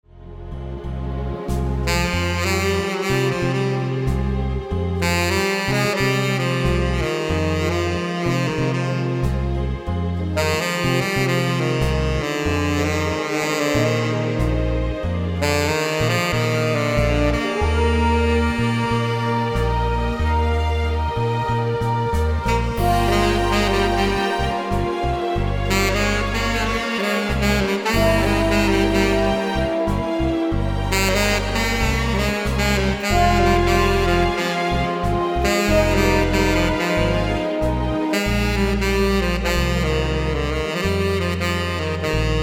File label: sax instr